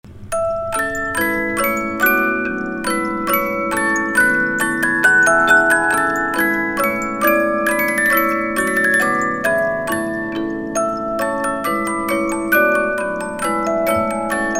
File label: magic minuet turkish 72 note, musicbox